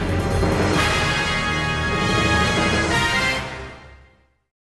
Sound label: news open; 9news